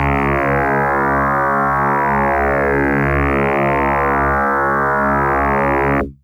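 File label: Electric Bass DX100 C#1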